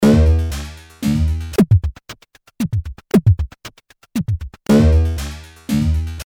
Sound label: flanger, korg prophecy, synth; flangered